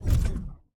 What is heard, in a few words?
Hand Paddle Release Hard